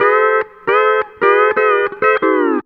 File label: COUNTRY